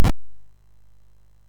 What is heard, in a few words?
Dragons Den, MOS6581R3